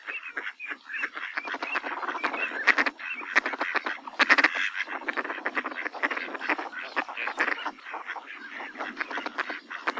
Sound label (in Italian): germano maschio, birdsound